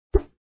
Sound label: FOLEY WHIP WHOOSH DOUBLE ALUMINUM ROD; bubblespinner; html5 games